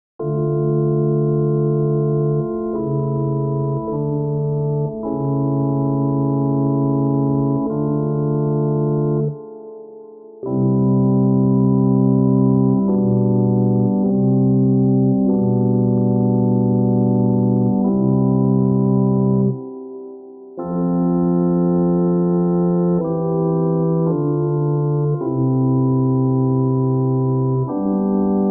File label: Music for Funeral Home, Ambient